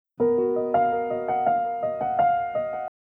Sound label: piano